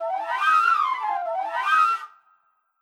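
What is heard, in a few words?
Minstrel Instrument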